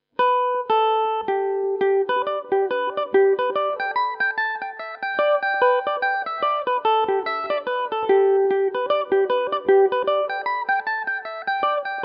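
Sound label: G major, Irish mandolin; flowing tide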